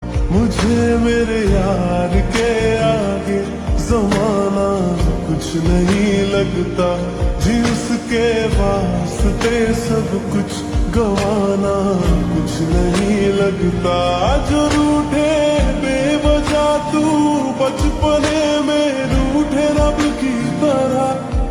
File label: Ringtone Slowed Reverb